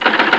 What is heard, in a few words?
machine; PUZMANIA